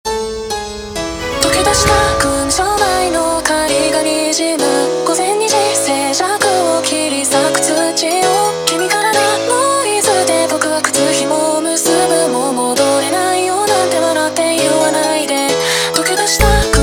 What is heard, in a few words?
Montagem slowed